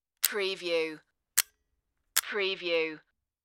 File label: camera release click